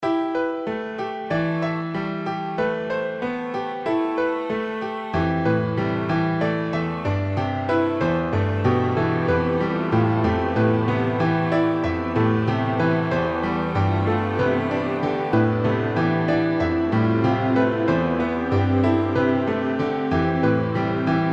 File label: modular